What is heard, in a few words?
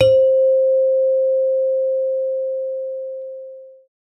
chimes C5